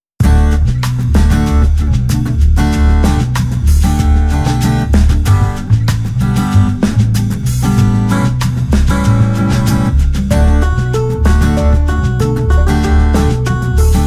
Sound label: stereo MUSIC only; JOY